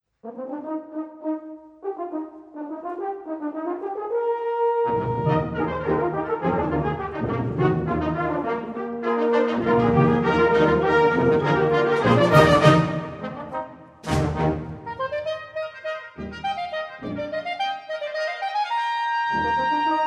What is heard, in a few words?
Concertino for Soprano Saxophone, Fanfare; Fanfare-Band; 1999; Part 1 Allegretto